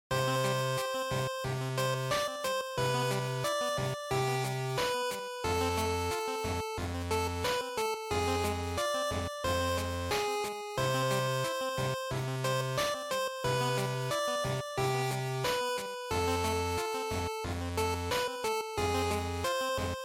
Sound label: GB Racing